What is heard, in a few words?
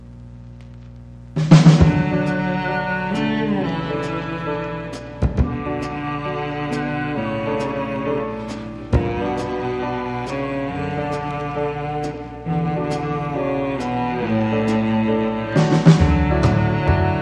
Instrumental